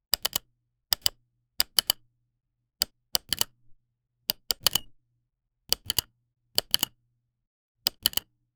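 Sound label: tactile button click mechanical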